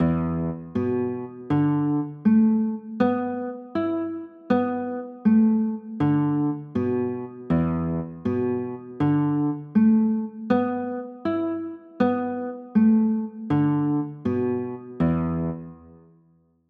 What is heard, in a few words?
e sus4, chords; e 7sus4